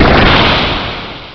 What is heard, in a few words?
explode; sci-fi sensation